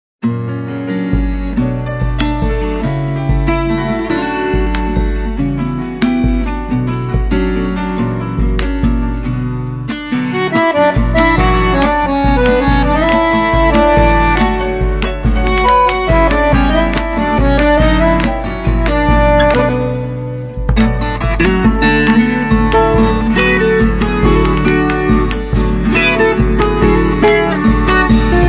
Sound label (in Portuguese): viola e acordeon chacarera